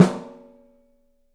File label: OPEN STEEL SN; 3D Drums